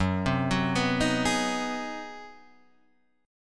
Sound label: Ab 7th Sharp 9th, Gb